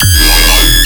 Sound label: Bass Hit E, Dubstep; Vortex Void; Ghosthack; Demolition